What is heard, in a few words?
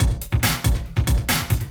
Loops BB 140, BreakBeats; Vengeance Samples